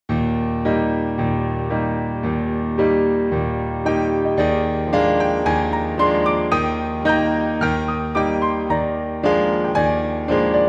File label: Assembles, ballet; adv 1 ballet; Enchainment Music - slow 4/4